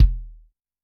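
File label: bass drum, Kick, Yamaha DTXpress-II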